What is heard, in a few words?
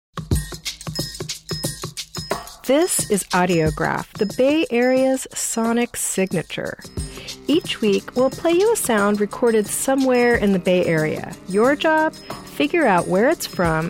Bagpipe Promo; AUDIOGRAPH